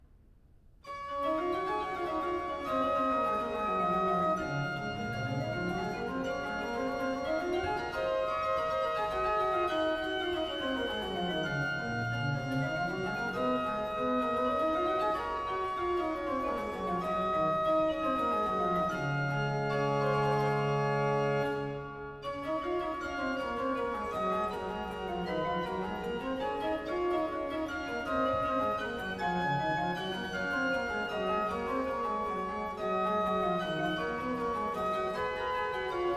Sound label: Verse 3 Organ; 'Tallis'